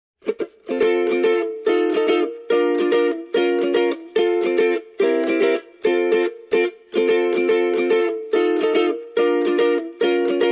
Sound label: tanglewood cove creek tu 10 solo